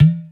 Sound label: Ghat Ham Hit, Percussion; Ambient Grooves